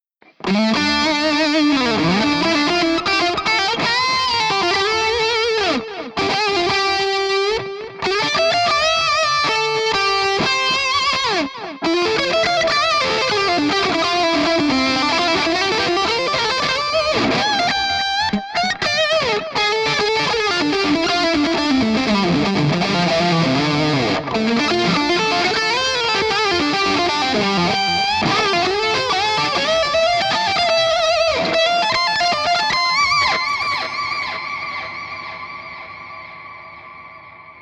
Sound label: solo delay